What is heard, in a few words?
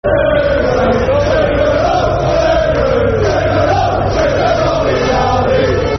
Football Chants (Soccer Songs); Super Rob; Blackburn Rovers Brfc